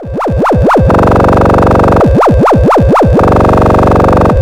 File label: SILLY MOOG; Zero-G Ambient 1; CRAZY FX